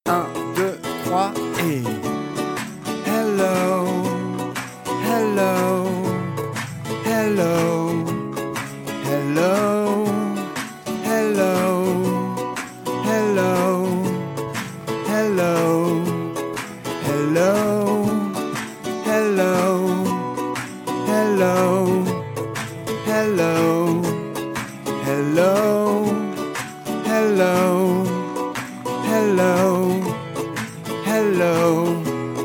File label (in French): refrain outro voix